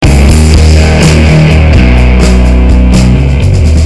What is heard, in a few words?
depressing rock music